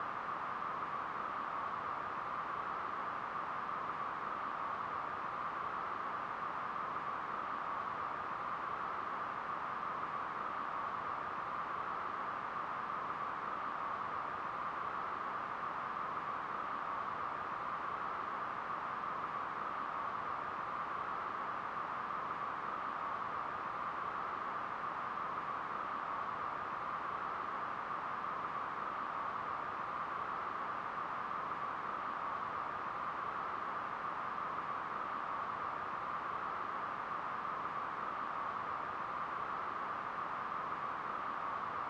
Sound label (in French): Bruit blanc, Bruit noire; pour dormir